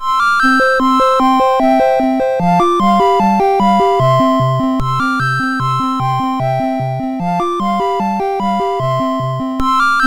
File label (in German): Schwaben-Tanz, MOS6581; MOS6581R2